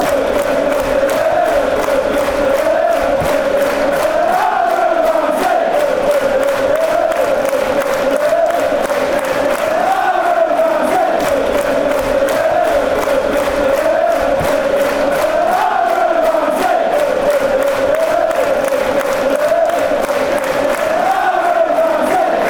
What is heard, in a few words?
fan chants; aaron ramsey arsenal